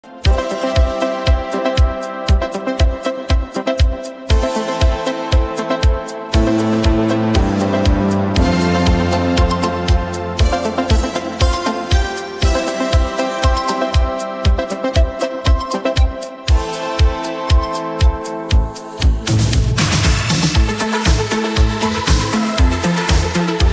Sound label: italo disco 80 s